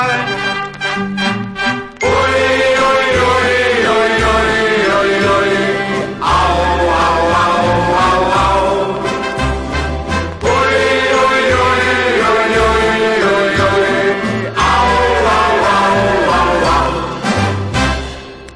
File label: uiuiuiuiui auauauauaua